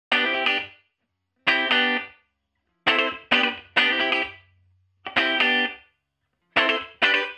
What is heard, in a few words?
Bypassed Electric Guitar; DelaySon